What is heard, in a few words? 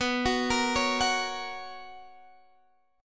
B Major 7th Suspended 2nd B down Guitar Half Step Down